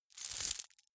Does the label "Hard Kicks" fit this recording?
No